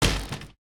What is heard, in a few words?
wall door; Golden Crayon Sounds